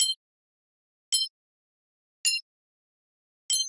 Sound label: Digital Click Neutral